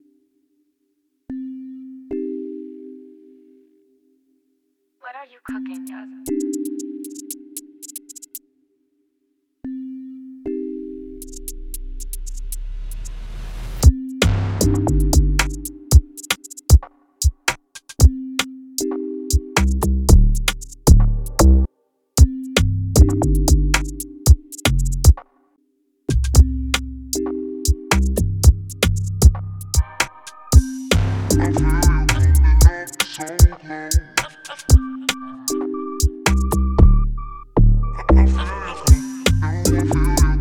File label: Type Beat